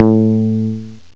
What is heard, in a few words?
SYN BASS; M8; CMI Factory